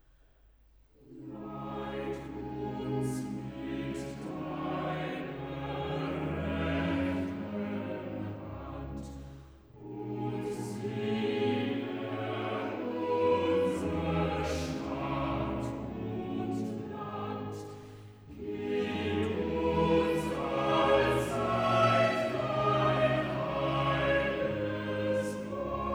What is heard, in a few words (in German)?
Cantata, Choral; BWV 90 'Es reißet euch ein schrecklich Ende'; V. Choral 'Leit uns mit deiner rechten Ha; Bach; Potsdam; 19th Sun After Trinity